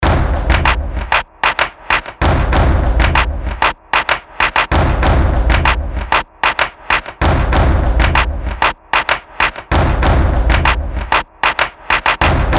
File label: Instrumental